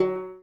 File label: ukelele; Notes